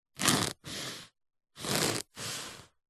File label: foley foam block dense cut slice saw serrated knife x2 slow cuts